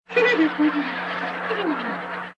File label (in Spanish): risa; quico